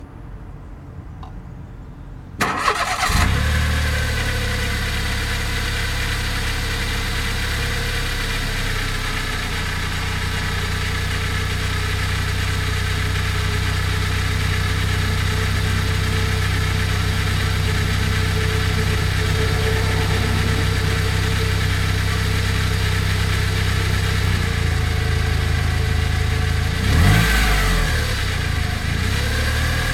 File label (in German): motorlauf; smart